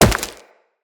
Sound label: Tenno Wall Jump Rock, footsteps, wall run; Characters